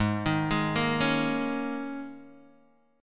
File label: Ab Suspended 2nd Suspended 4th Ab down Guitar Open D